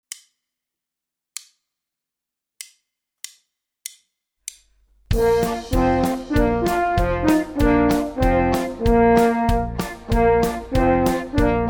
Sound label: Ringtones (duet)